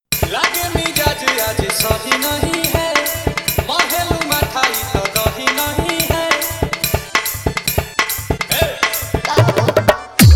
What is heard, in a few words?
Dj Song Hard Bass Mix